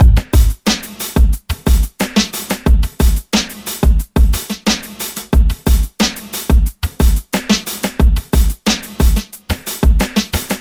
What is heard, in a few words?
shovel 090bpm; Zero-G; Downbeat Dance Grooves